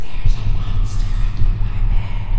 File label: error